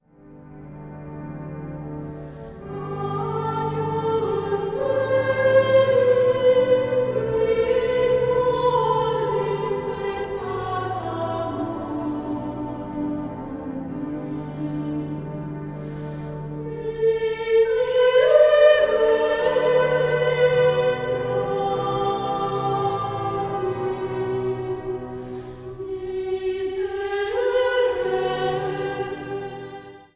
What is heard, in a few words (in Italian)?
voci